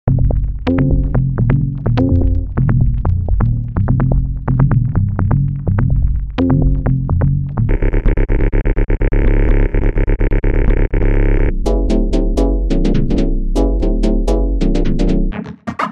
modular sequences

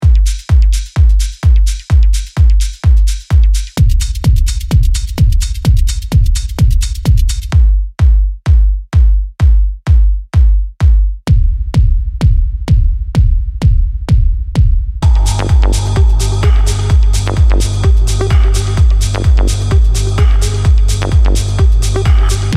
techno

